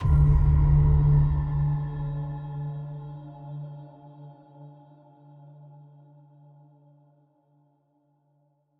Ending 1st Impact

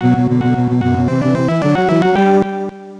Jingle Boss Victory

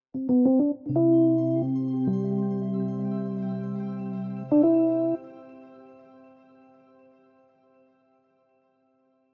theme siblings head 10and a half beats 120bpm

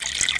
sound (water)

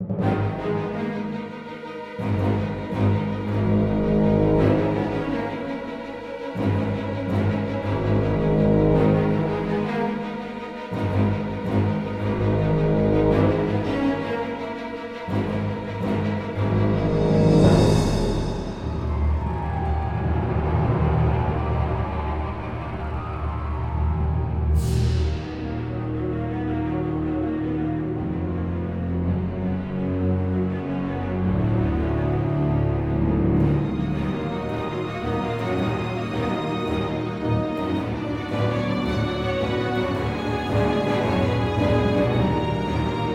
Starfighter Music; Galatic Starfighter

